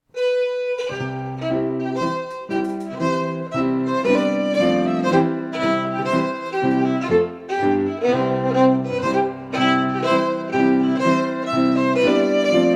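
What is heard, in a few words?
Reel, Scottish Fiddling; Macglashan col 1796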